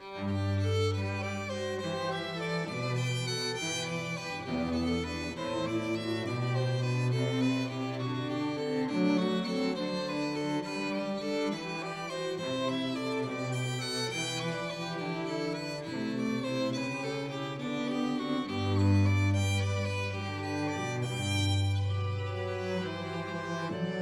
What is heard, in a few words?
String Trio Ensemble